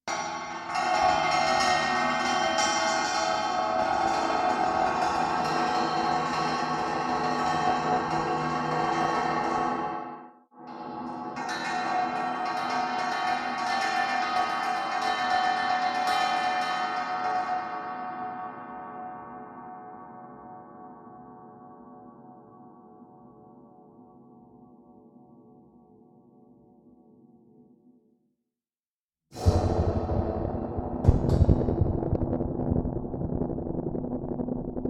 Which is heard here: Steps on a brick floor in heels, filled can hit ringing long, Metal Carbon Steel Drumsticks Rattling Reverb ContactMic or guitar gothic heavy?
Metal Carbon Steel Drumsticks Rattling Reverb ContactMic